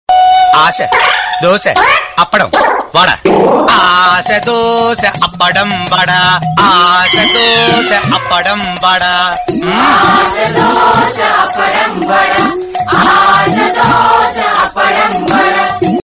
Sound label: huawei y9 ringtone